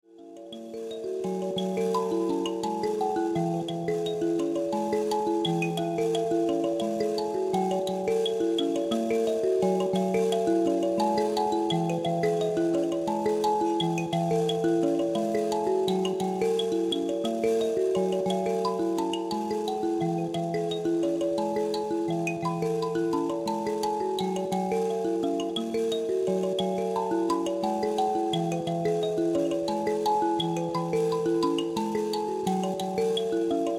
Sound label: mbira